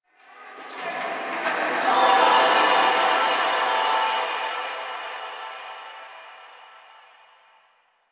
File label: Memory Echoes